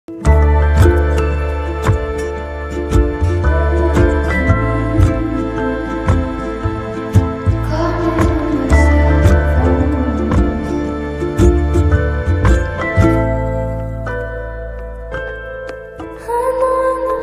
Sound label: BGM ringtone